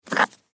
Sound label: Food Spam Can Pick Up; Food Sound Effects; Household; Foley